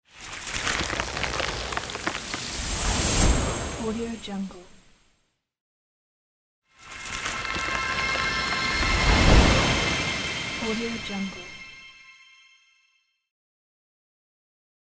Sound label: organic logo